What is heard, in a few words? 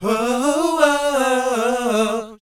SHOO B, E, Vocal Planet R&B & Dance; ILIO; Vocal Planet VOL-4; SHOO BE 80